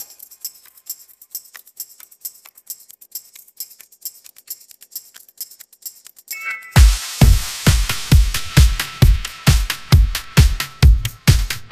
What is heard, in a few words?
ringtone kpop